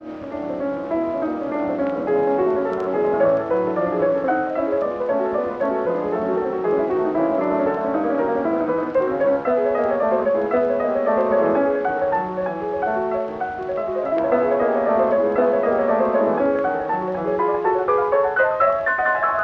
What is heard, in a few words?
Prelude in C sharp minor op 3; loc-edison